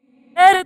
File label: Vocal Glitch; Zero-G Vocal Lix